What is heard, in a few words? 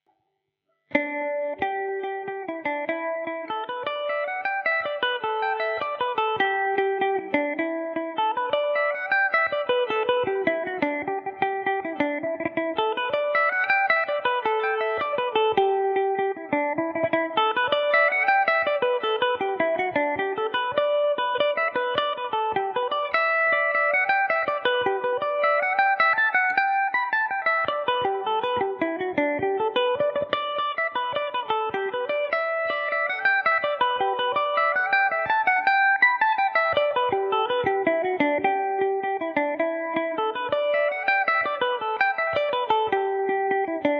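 G major revelation, irish mandolin; flying wheelchair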